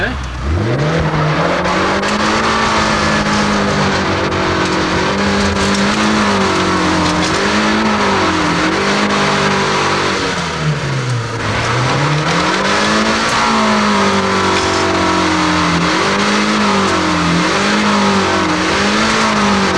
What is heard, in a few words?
impy, engines